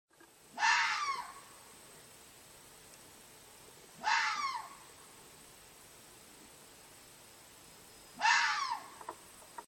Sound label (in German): Bellender Fuchs